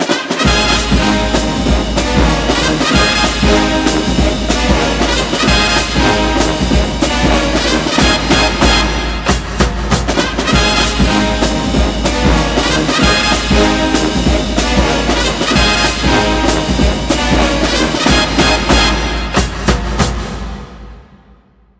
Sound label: Fight Song